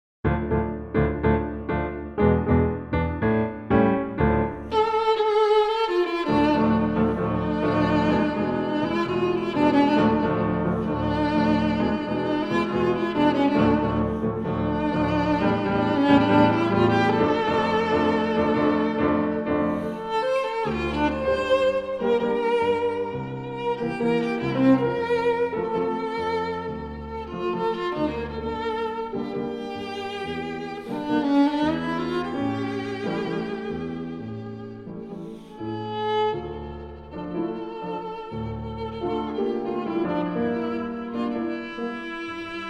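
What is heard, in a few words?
play violin reference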